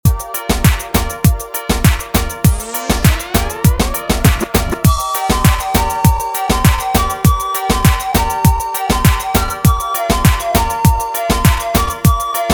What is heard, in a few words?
clean 100bpm Intro Outro; Latin beats; Peru